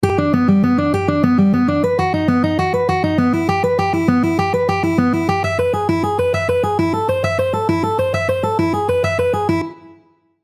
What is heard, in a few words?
exercise 100bpm